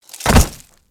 Kneel Down Foley; Warframe; Tutorial; Capt Vor; Cinematics

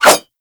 Dagger Whoosh; Warframe

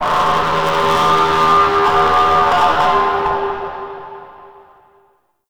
Resonating Didgeridoo; Warframe; Shadowgrapher

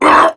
Pain Prime Grineer Male; Tau; Battle Barks

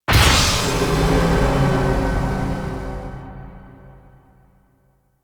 Face Destroy; Encounters; Ode Pollution Tower

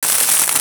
Hammer Boss Ice Missile Loop; Warframe; Grineer; Abilities

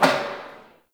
Metal Garbage Hit; Warframe; Ambience; Nineteen Ninety Nine

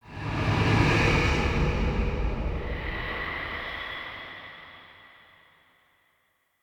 Boss Killed Stinger; Hud UI

